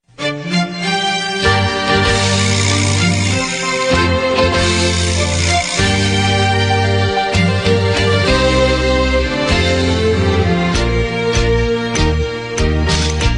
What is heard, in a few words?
Chinese Ringtone